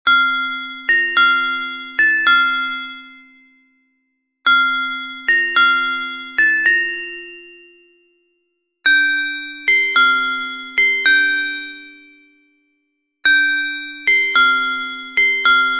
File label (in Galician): RUMBA Metalofono Contralto